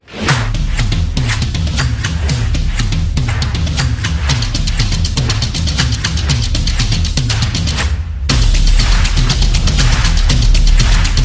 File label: Drum Background; stomp and clap